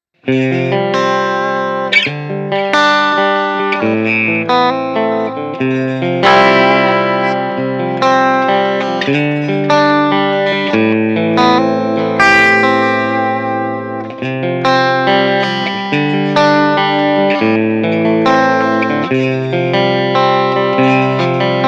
Clean

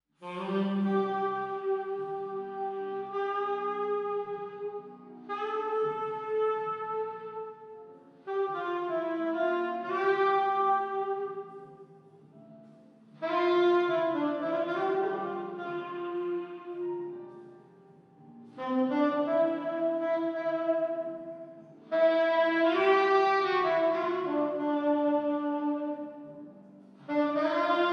Tenorsax EMT250 SOLO

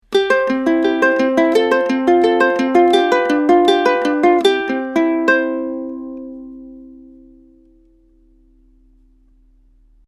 U87 Ukulele

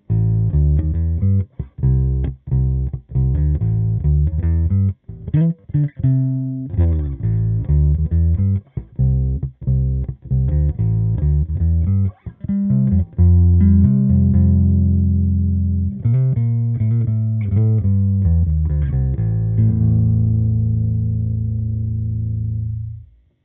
Block 300B Aria Shortscale Cab

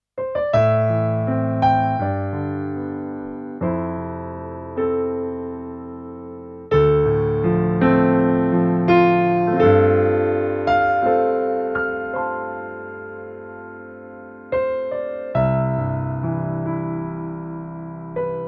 Yamaha P S500 CFX Grand Piano

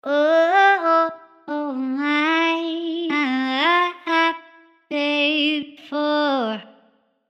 Vocal Samples Dry; Portal

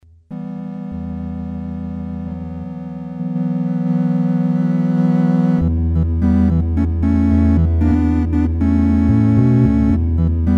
Roland VR09 Vox

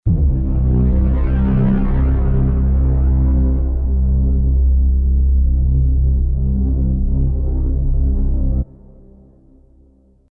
Elysion 2 Envelope Blurry Particles

